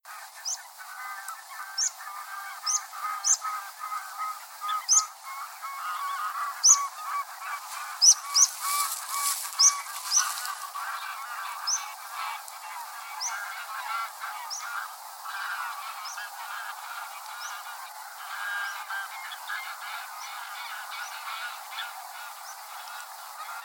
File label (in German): Bergpieper; Mohrhof-Weihergebiet ERH; Matthias Bull